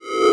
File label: Science Fiction Sci-Fi Electronic Distorted Swell